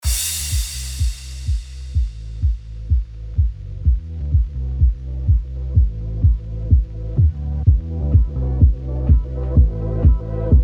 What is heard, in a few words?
Party Instrumental